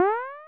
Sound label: jump; epervier-framework